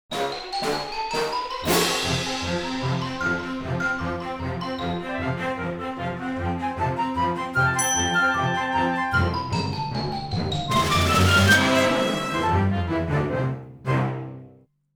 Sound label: 10 Bars of Cartoon